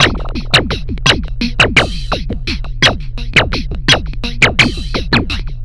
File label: Drums Strange